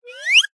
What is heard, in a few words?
cartoon slide whistle